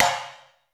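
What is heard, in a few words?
GATE percussion cymbal; Crypton; DrumBase5000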